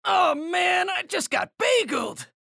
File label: pain ability damage; anarki; low health